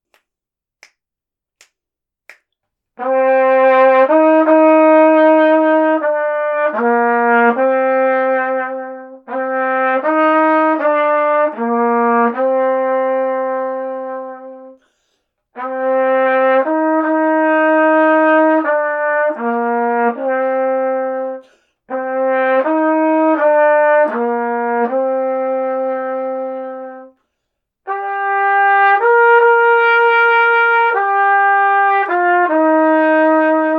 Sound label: duet 1st part, trumpet; dvorak9